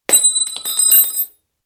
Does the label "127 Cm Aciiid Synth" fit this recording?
No